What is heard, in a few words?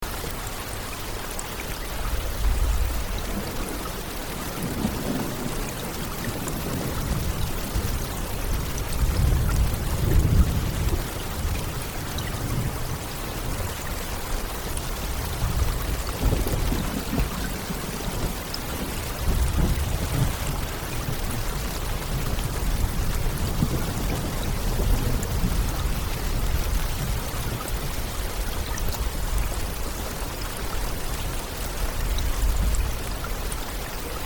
Thunder Storm Background